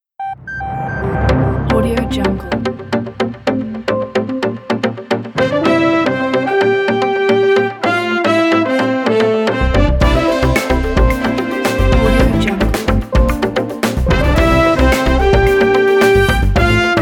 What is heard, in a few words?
news open theme; flaviocortizo